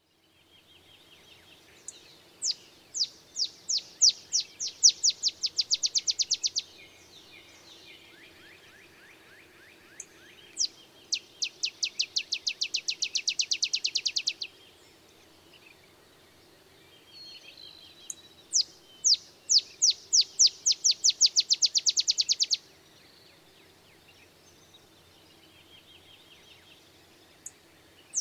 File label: Olive Sparrow; The simple songs are reminiscent of the bouncing ball song of a field sparrow, though neither so mellow nor